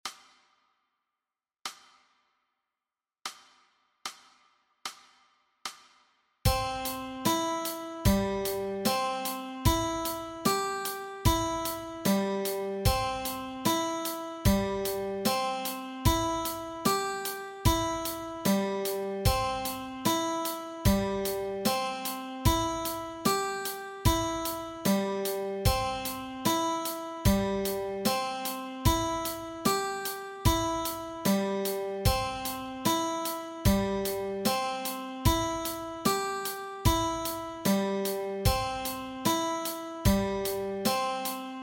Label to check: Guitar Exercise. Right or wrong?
Right